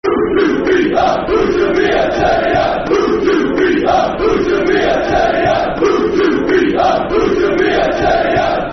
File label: Football Chant; Ooh To Be A Terrier; Huddersfield Town Htfc